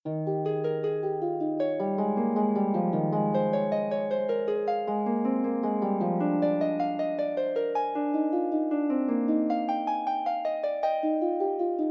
prelude, Harp; Forty Eight Preludes and Fugues Book 1

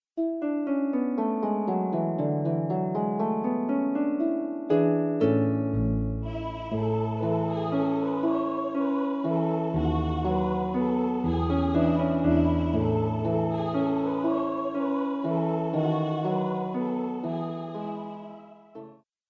Voice and Harp; el tra la la punteado

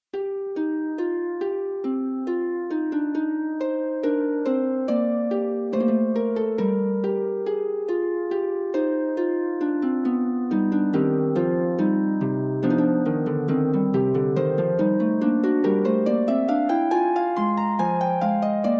Fugue, harp; Forty Eight Preludes and Fugues Book 2; prelude and fugue no 2 in c minor book 2

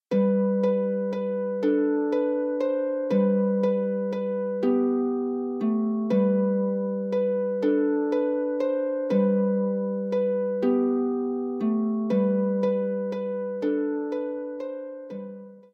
Harp; Tudfuls Harp; Gi Ceffyl Bach; Gee Up Little Horse

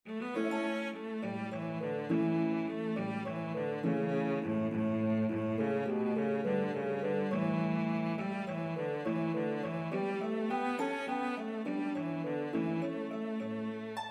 Harp and Melody Instrument in C Cello; Banish Misfortune

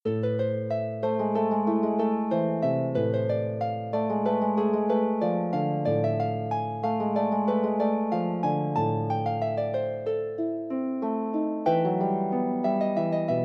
prelude, Harp

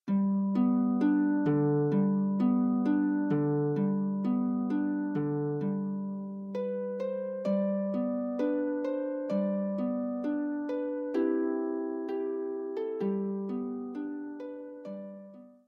Harp; Tudfuls Harp; Ble eir Di; Where Are You Going

